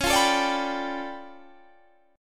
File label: D Minor Major 13th, C sharp